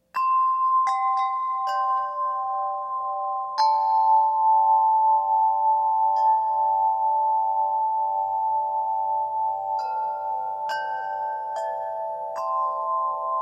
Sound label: Summer large, wind chime